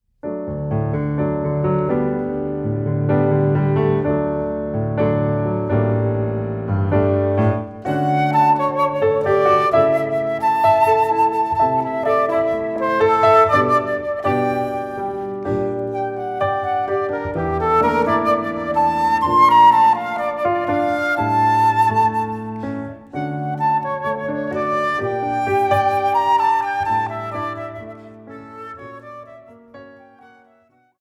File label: Innocent joy flute and piano